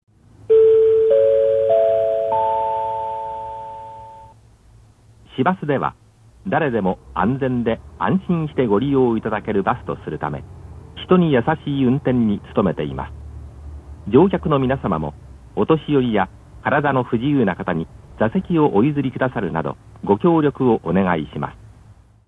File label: citybus; yasashii